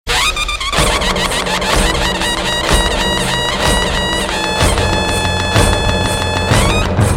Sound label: best patriotic